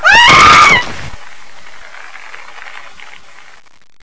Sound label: hanger scream